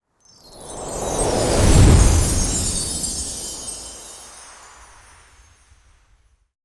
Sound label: Magical whoosh transitions christmas slow transition